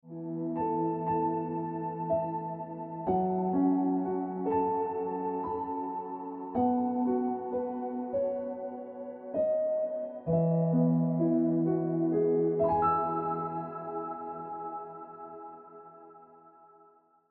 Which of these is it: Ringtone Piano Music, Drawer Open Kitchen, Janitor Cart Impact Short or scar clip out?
Ringtone Piano Music